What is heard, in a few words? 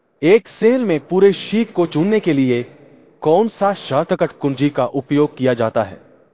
techno